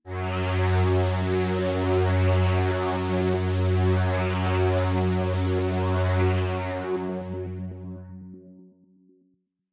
Tech Synth F#2, techno; musicradar; 120bpm; Synth P Multi

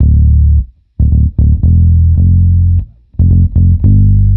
Bassline 110 D, hiphop, 110bpm, Bass; musicradar

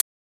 Closed hat, techno drum

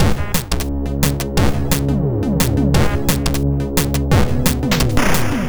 Chip Shop 175 Combo C#; 175 BPM; CHIPSHOP DELUXE; CHIPSHOP LOOPS

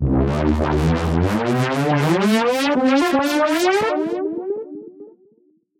Tech Synth 120 C, techno; musicradar; Synths

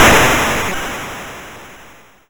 YM Noise; NOISES; ChipShop Deluxe; ChipShop Hits

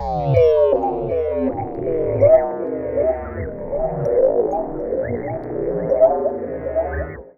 Mo Slo, techno drum fx; Single Hits

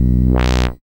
SID Bass B, chip; CHIPSHOP HITS

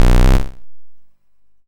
YM Bass BC, chip; CHIPSHOP HITS

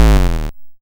YM Bass D#m; ChipShop Deluxe; ChipShop Hits

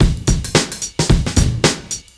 Beat 110bpm; musicradar

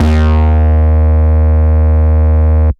DnB Bass dist D#2, drumnbass 170bpm; musicradar; Bass A Multis

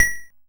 Xylo B3; multi-samples; CHIPSHOP DELUXE

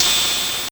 ChipShop GB Tom; DRUMS, TOMS; ChipShop Deluxe; ChipShop Hits

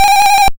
YM Ringing; SFX; CHIPSHOP DELUXE; CHIPSHOP HITS

